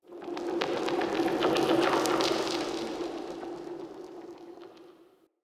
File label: Magic Cave Texture, Ambience; Fey Hub